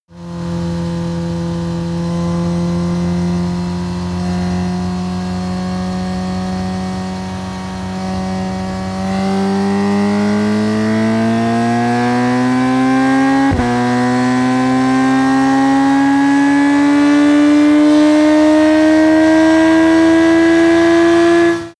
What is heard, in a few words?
motorcycle sport bike acceleration slow fast